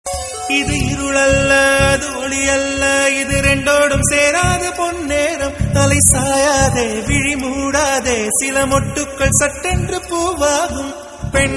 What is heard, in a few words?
song, tamil old